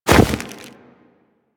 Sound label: Walk Deep Snow, Footsteps; Warframe; Operator